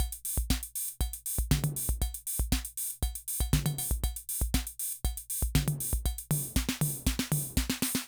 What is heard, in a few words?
Loop, rhythm 55, classic machines